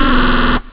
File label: doom, effects rave